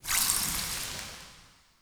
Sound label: Passive Sparks; Powers; Fey; Champion